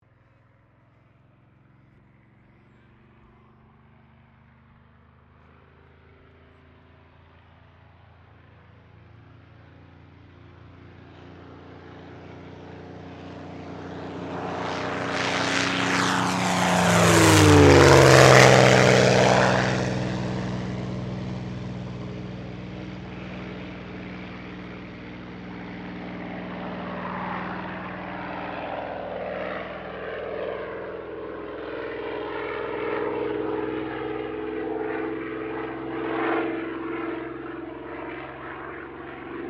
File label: AIRCRAFT SUPERMARINE SPITFIRE MK-XVI TAKEOFF STEREO; Airplanes; Sound Effects:Vehicles